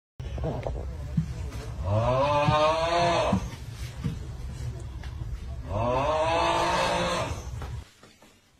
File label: loud snore